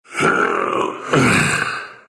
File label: grunt, ru; dota2